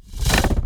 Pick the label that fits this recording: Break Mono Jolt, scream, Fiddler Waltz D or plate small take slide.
Break Mono Jolt